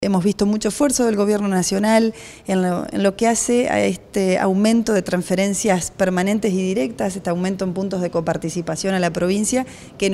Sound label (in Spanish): VIOLA